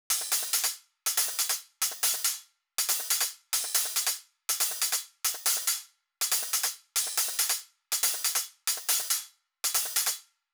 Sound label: 140 BPM Break HH Loop; Essential Clubsounds Vol.5; Catch a Plane Kit; Root A#min; Drums & Perc